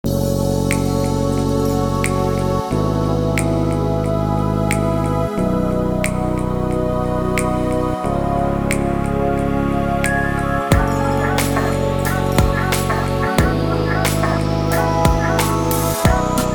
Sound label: K Pop; Black Octopus Sound; Mildang; Killsam